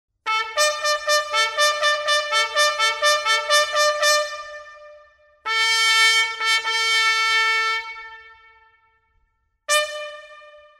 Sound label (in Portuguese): ordinario marche, toques